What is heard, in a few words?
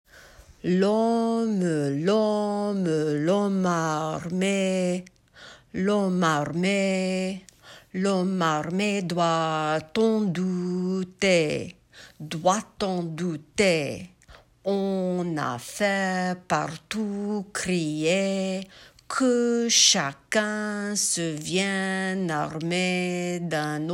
L'homme arme in rhythm